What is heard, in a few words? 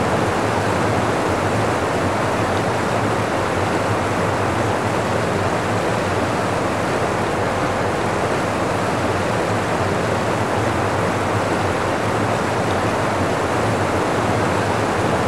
Waterfall